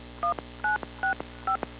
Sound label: dtmf